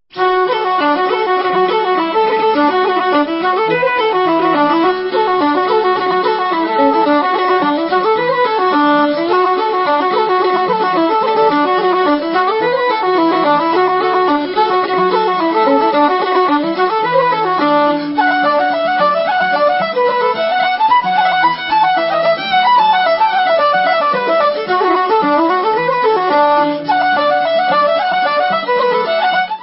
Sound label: Celtic Music reels; Paddy Fahy's